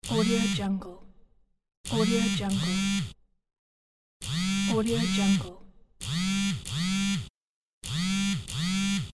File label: cell phone vibration